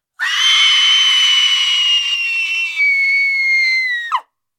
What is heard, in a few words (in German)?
Frauen Schrein